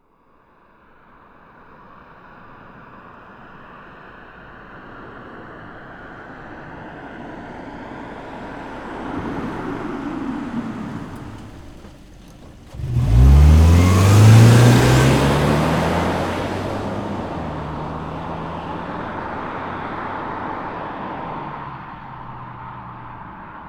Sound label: Subaru Legacy 2.5 GT, approach stop drive away fast; Soundholder